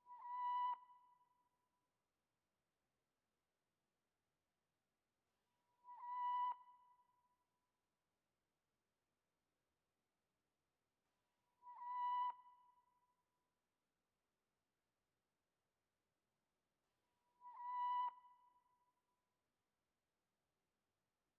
166 BPM B Min Blip; Melodies; Loop Stems; Cymatics; 2022 Melody Mystery Expansion; Midnight In Seoul

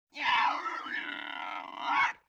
LoFi Synthetic Bird Caw; Vintage Cartoon; Comedy Vocals

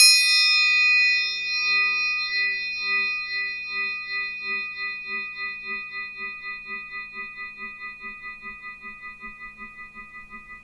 fx triangle; Cartoon - film effects

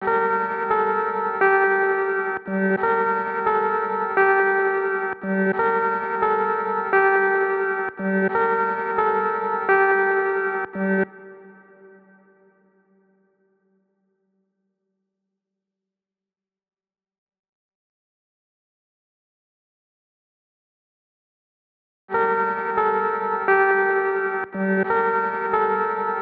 87 BPM G Min Piano; Melody; Trap; Loop Stems; Cymatics; 2022 Melody Collection - Trap Pt 1; Antidote

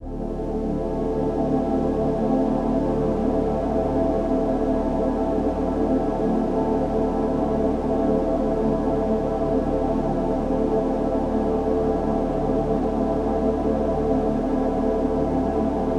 Tonal Ambience D Min; FX; Crust

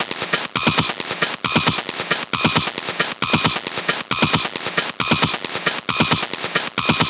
Perc Loop 135, Techno; Ghosthack; Techno Sounds 2025

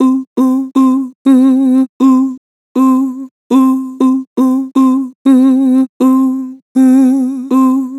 Vibrato "Ooh", Harmor Vocals

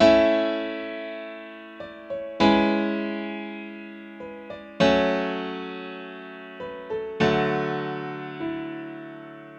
Grand Piano 100 BPM (F# Minor); Views; Ghosthack; Free Guitar And Piano Samples

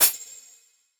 Hihat; Ghosthack Advent Calendar Day 4

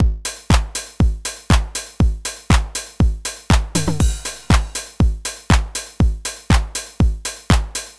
Loop Drums 120, Deep House; Lover Groove; Ghosthack; Advent Calendar 2019

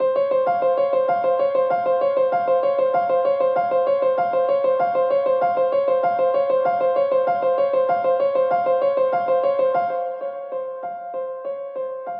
West Coast Melody Loop - 97 BPM A# Min Piano Arp; Melodies; Loop Stems; Cymatics; Oracle Sample Pack

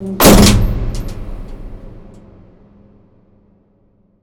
impact hatch; Hits, Impacts

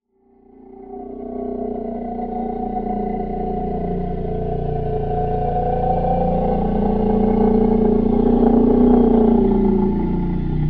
Dark, Deep, Dark

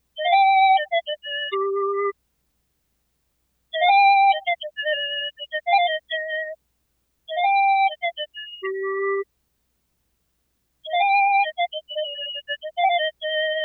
Various Melody Loop - 135 BPM C Min Flute; Loop Stems; Cymatics; 2020 Melody Collection

